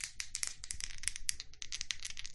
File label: roll dice